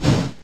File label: Fusion Flare; pokemon reborn e19 2022